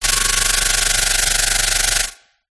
sound design frequency modulation short static noise burst with high pitched; whine